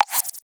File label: Jump